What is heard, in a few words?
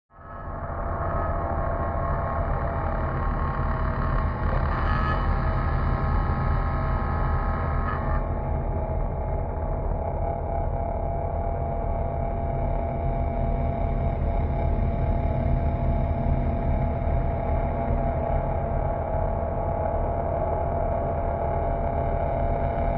Drone